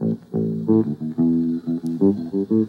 bass; Phonky

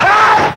scream sensai martial artist; ooh ahh

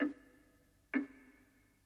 clock ticking

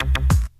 keys, jazz loops

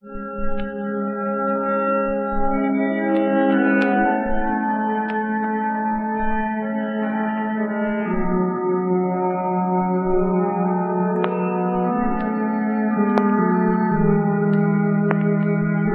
strings chorals of madness; Loops; Sad

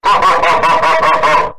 laugh satan

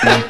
huh, Voice

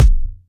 Kicks Loud

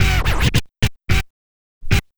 geetar, Vinyl; Misc Percussion

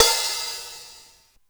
Cymbals

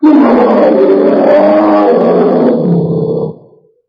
bear; Animals

